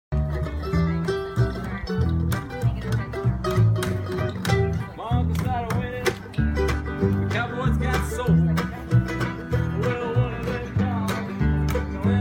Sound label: String Band